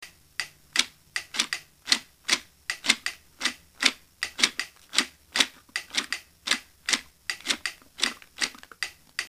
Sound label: Rhythm